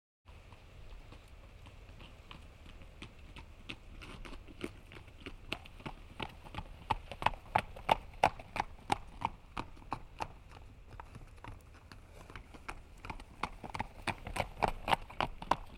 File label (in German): sport - vorbeitrottendes pferd